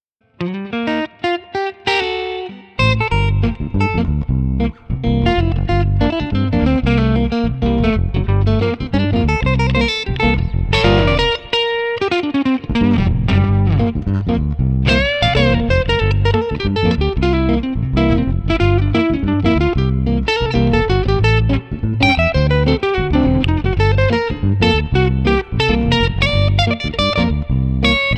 Guitars Bass Cool Lead 93

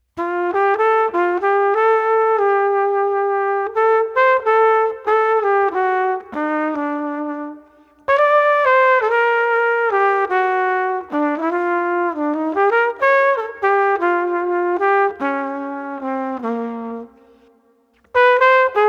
Street Flugelhorn Nonchalant 100

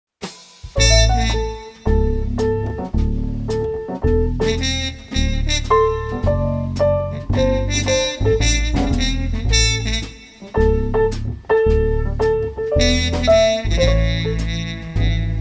Jazz Piano Trio Cool Trumpet 110

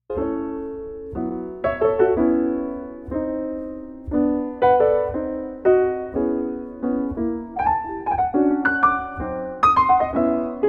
Piano Bar Gentle Levity 120

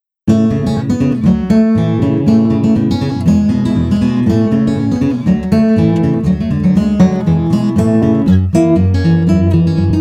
Guitar Country Jazz 120

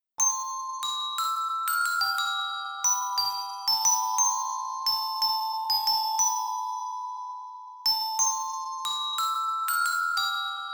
Glockenspiel Strings What Child 120

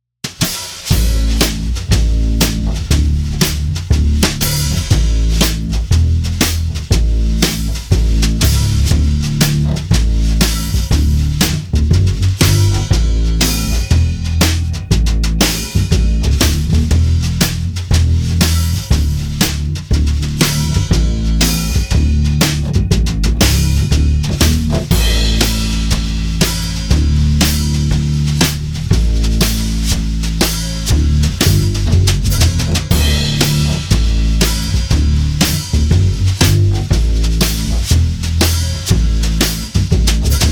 Blues Underscore

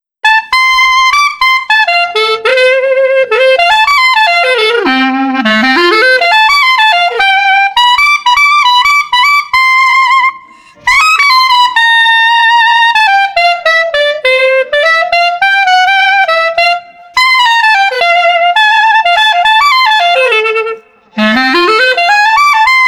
Street Clarinet Festive

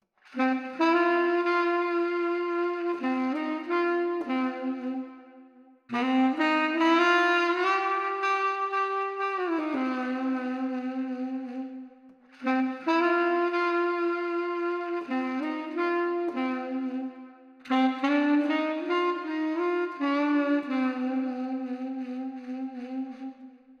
Street Baritone Sax Downtime 82

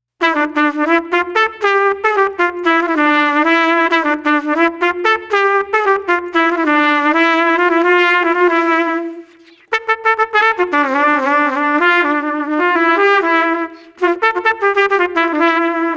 Trumpet Jazz Melody 130

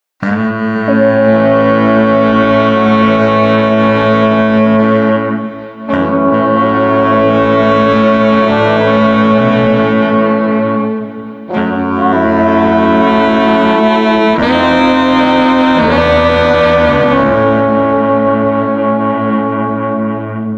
Street Brass Mournful 85

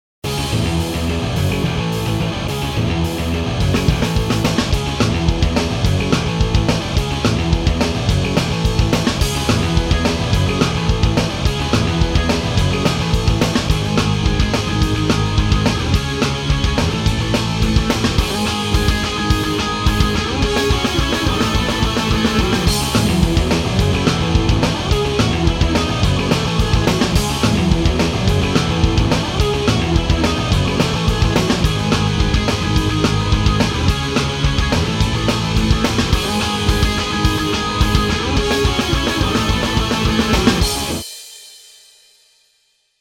Pop Punk Instr

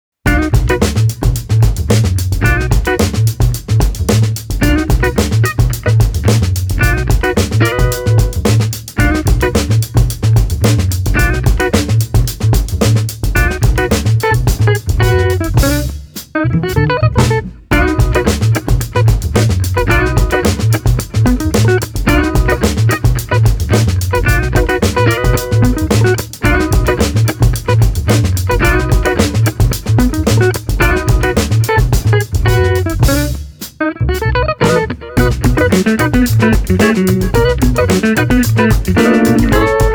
Guitar Combo Cool Funk 110

